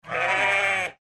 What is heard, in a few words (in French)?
moutons